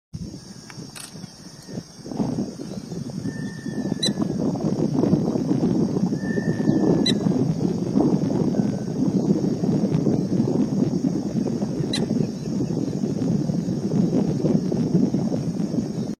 canto do carqueja de escudo roxo

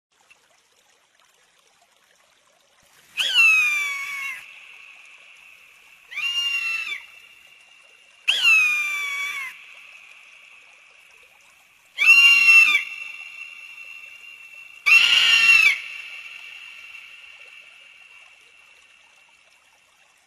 canto da aguia audaz

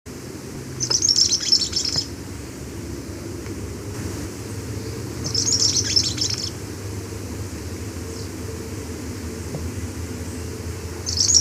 Canto do fura flor preto

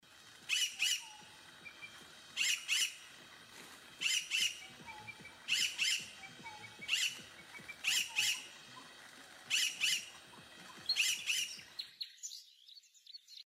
Canto do pega verde de borneu

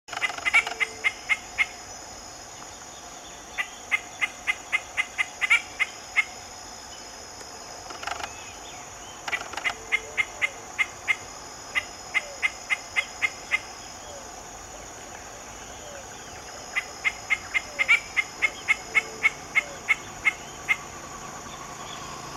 Canto do fura barreira

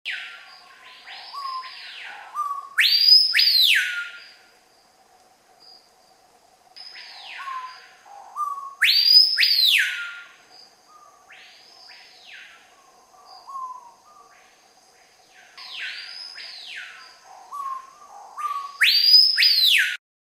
Canto do cricrio seringueiro

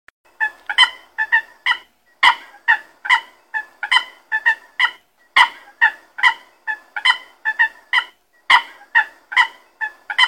canto do galeirao americano

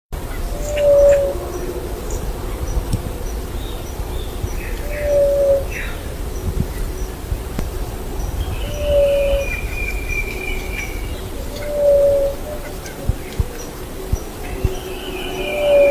Canto do Juriti vermelha

